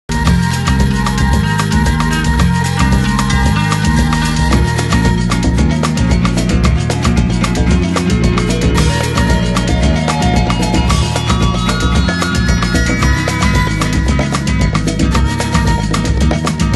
bossa

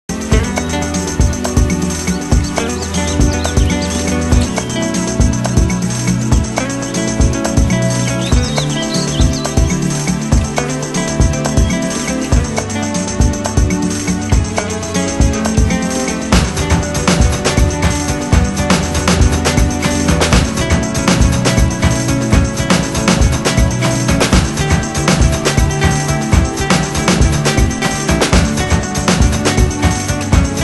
bossa

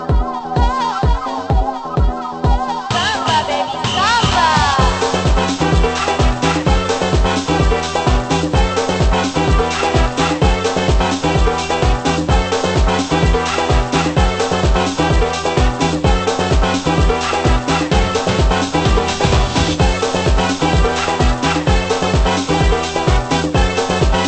spanish

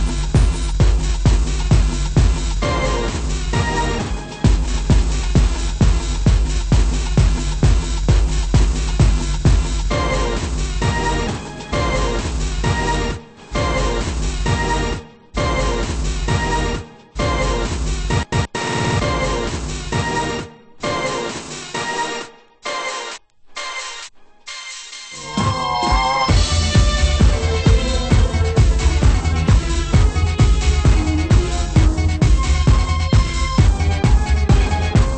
waltz

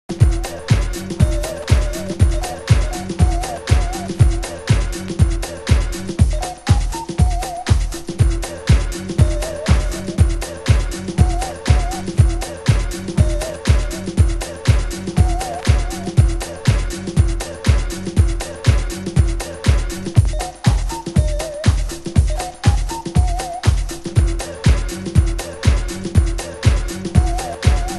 kick

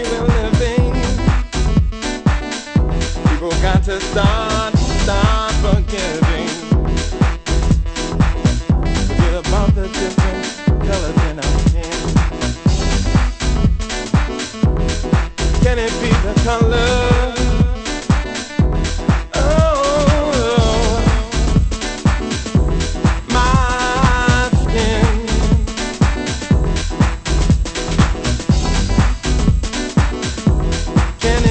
swing

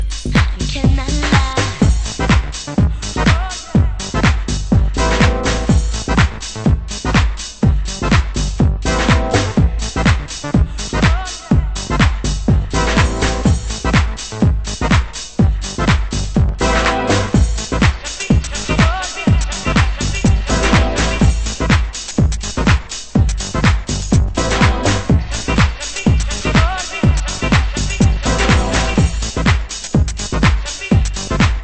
vibe

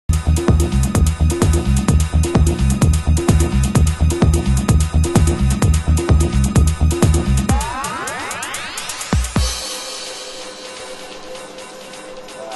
kick

